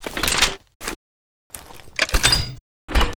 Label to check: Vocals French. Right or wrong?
Wrong